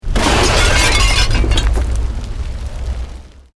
Explosion Medium Brick Wood Glass

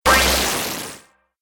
Plasma Vaporizer, Laser Gun Sound Effects

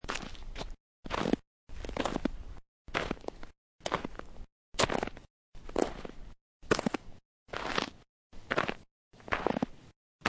Snow and Ice, Footsteps snow and ice; Weather

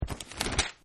Page Turn; Offices; Partners In Rhyme

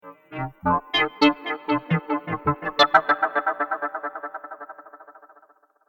Spinning Space Fragments, sci fi sound effect; Andrew Fleming

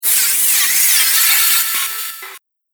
Mage Spell Casting, magic sound effect; Fantasy Sound Effect; Andrew Fleming

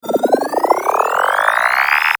Rewind: Cartoon / Scifi Style; Miscellaneous Toy; Toys

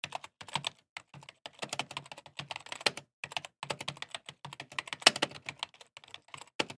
Apple Keyboard, Keyboard Computer, typing; Offices; Technology; Typing Sound Effects

